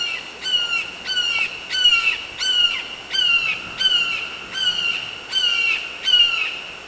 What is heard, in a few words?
red shouldered hawk; BIRDS